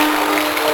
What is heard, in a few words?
GRIT CROWD PAD; E-MU Formula 4000 Series Vol. 5; Protozoa; Phatt Presets